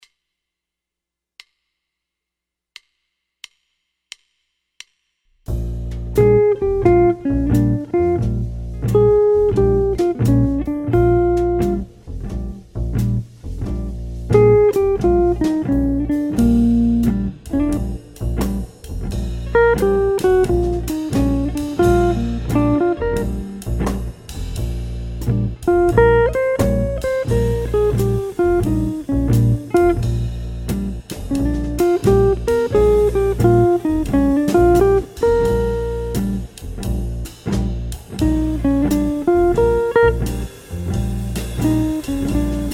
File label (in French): Playback, guitare jazz; osons charlie christian etape 05